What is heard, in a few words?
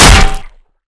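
spade hit body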